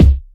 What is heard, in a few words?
Kick; Kick33